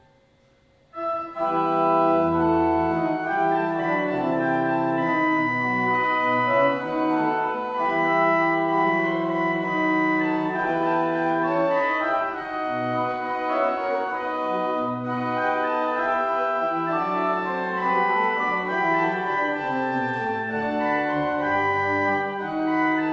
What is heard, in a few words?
Buxtehude Gigue, Orgel; Steinhoefel; joachim wagner orgel